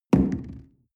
coffee place tablecloth medium wobble, restaurant cafe